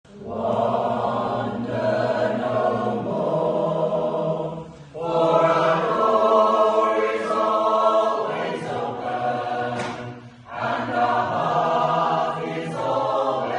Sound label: harbour